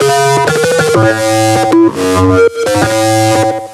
synth 128 C#m; Electro; Anthemic; synth loops

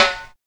reggae snare drum; SNAREDRUMS

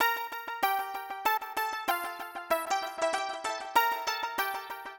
SYNTH 96 G; old school

